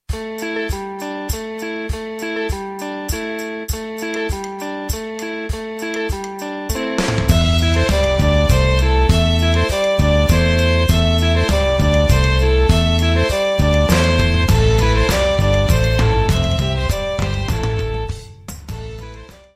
Hanter Dro - France

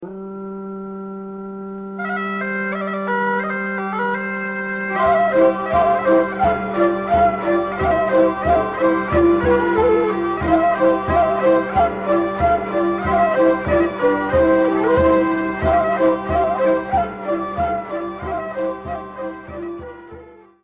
Valse sauteuse, Belgique; Valse sauteuse de Rett

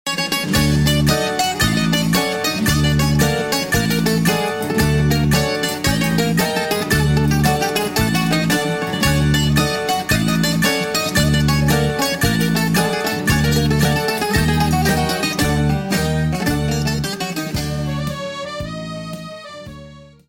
HASSAPIKO - Grece; ATHENS

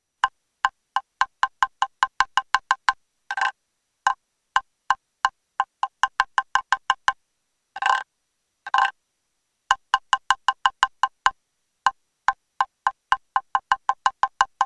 tambour de bois a fente

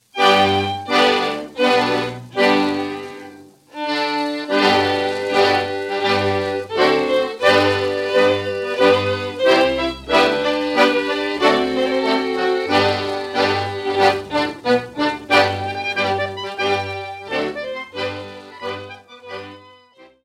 Masquerade, Danemark

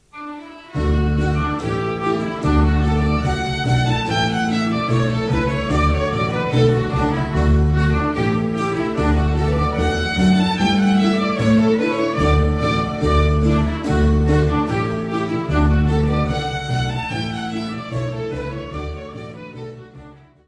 POLKA - Suede

